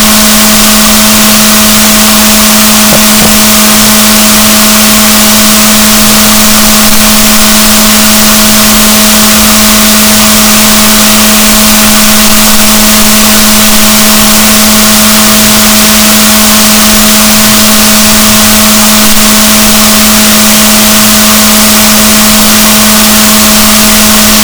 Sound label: rorquals negatif, gaussian noise; Balaenoptera Physalus; SNR 6dB